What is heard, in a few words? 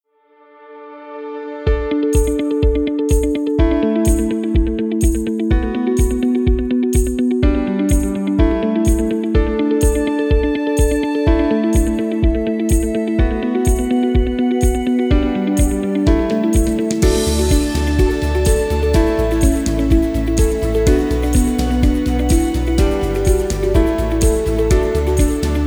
happy shopping upbeat